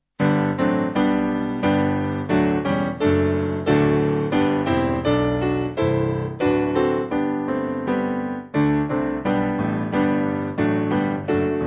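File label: Piano, church music backing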